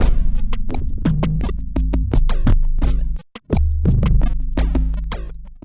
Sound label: Electro Shaman, Tribal Fusion; Ambient Cinematic